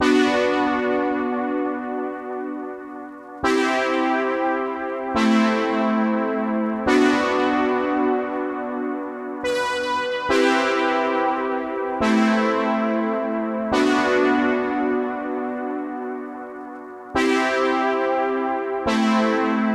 140 BPM B Min Chords; Cymatics; Destiny Gold Expansion Stems; Analog Nightmare

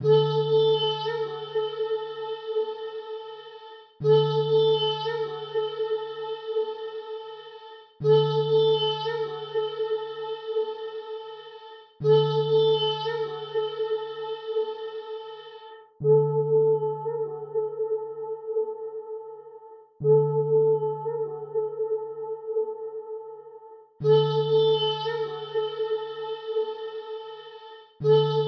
120 BPM D Min Flute Thing; Cymatics; Destiny Gold Expansion; Myst

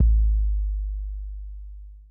Kick B Hit; Hip Hop Exotica; big fish audio; 096 Amin

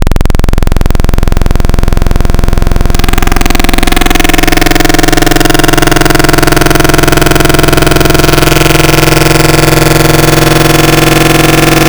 Detune, FM Wavetables; Cymatics; FM Wavetables Vol 3; Serum

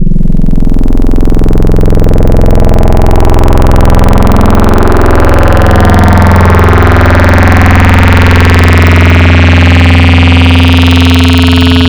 Sine Mod, wavetables; Cymatics; Wavetables Collection Vol 3; Serum

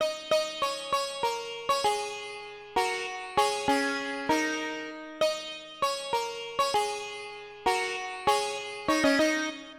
Sitar, Hip Hop Exotica, Apple Loops; Big Fish Audio

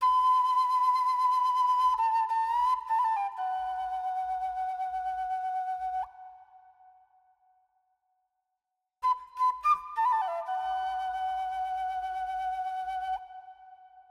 Flute, 068 Bmin

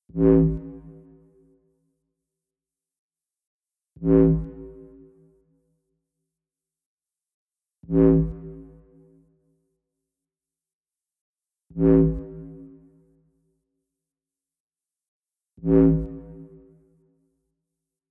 Extra Bass, Future House 124 BPM E; Big EDM; Future House Tools; Construction Kits; Rocking

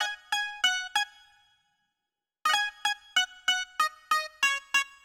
Baglamas, 095 Ebmin, Hip Hop Exotica; Apple Loops; Big Fish Audio

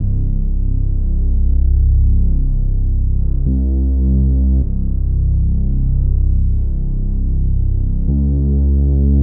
Bass, 104 Cmin; Hip Hop Exotica; Big Fish Audio

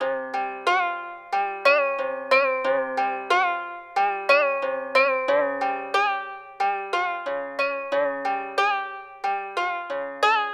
Koto, 091 Cmin; Hip Hop Exotica; Apple Loops; Big Fish Audio

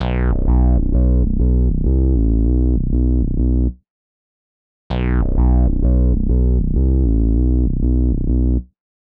Bass B, 098 Bmin; Hip Hop Exotica; Apple Loops; Big Fish Audio

